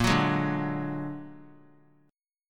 A sharp Augmented 7th A sharp, Chord; A#+7 strummed; JGuitar

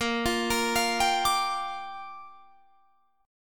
A sharp 6th, Guitar Drop D

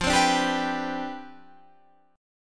C Major 7th Suspended 2nd Suspended 4th F, chord

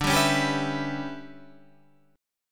E Minor 6th D, Em6/D chord; strummed; JGuitar

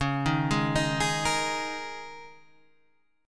D sharp 7th Suspended 4th

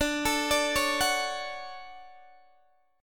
D Major 7th, D, down, Guitar DADGAD

